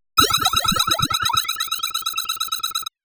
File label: Telemetry, Signal Frontiers; Digital Rain Lab